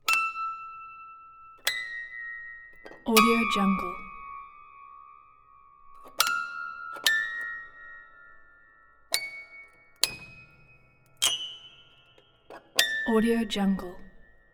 piano string pluck

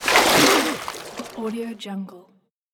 emerges man from water

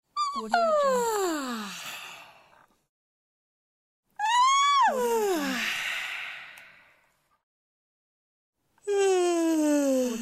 yawning pack

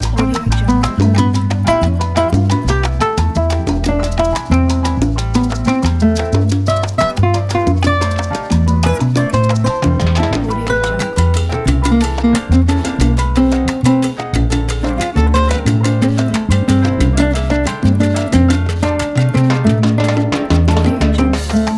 puerto rican guaracha short

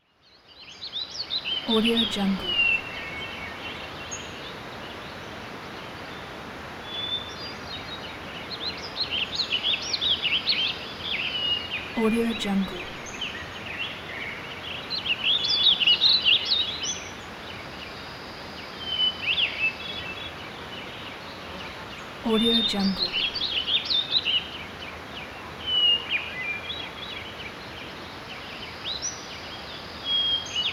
in forest

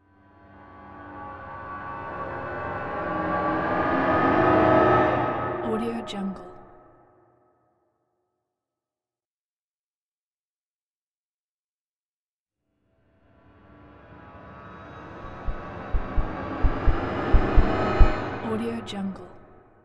cinematic horror riser

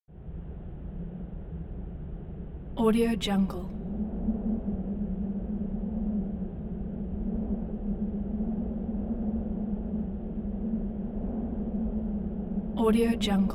wind storm interior powerful gusty howl tonal

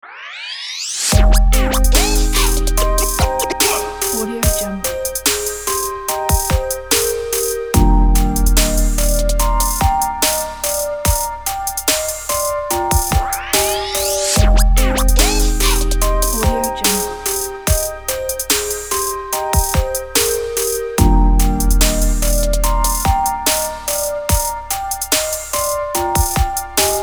logo hip hop pack

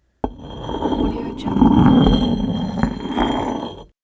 stone grind drag light

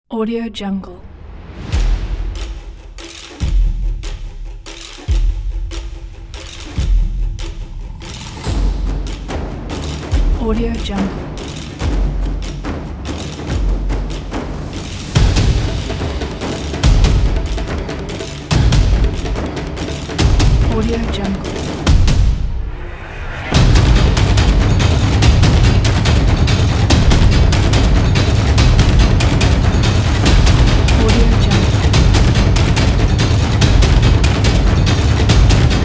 taiko

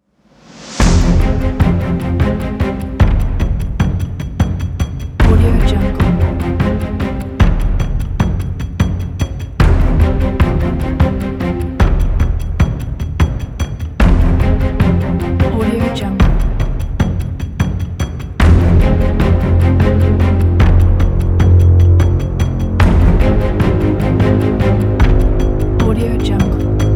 epic orchestral cinematic tension; stillaudio

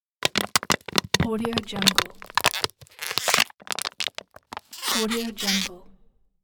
plastic bottle crush

